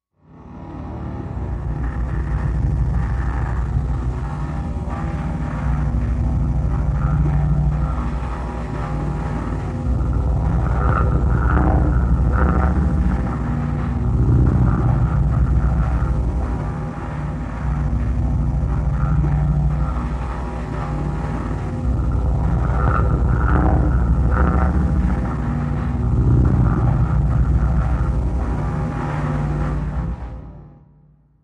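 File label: Deep Thought Empty Factory, sci-fi ambience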